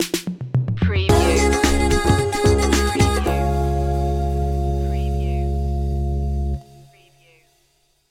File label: hindu pop stinger